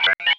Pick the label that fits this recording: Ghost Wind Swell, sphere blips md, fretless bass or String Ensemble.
sphere blips md